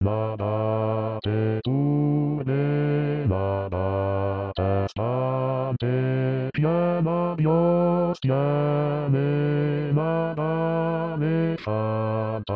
Nada Te Turbe Bassi

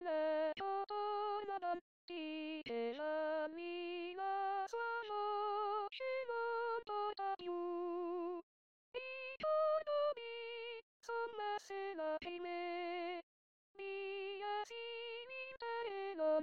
Tenori

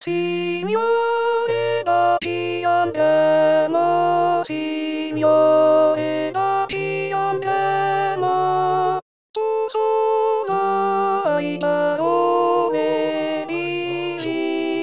Signore Da Chi Andremo Contralti; anno liturgico

